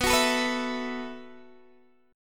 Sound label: B 7th Suspended 4th B down Guitar Open D